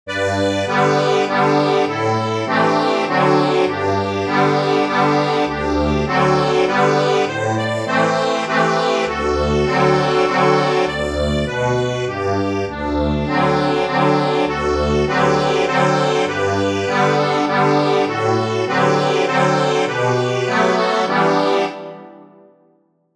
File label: accordion